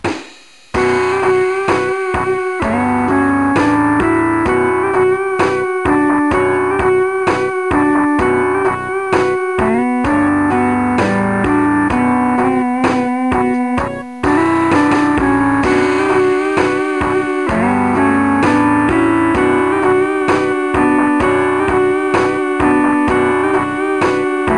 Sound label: guitar awsome